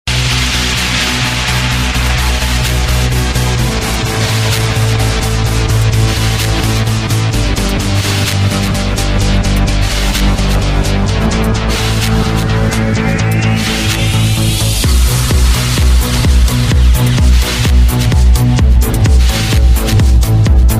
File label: Dj Mix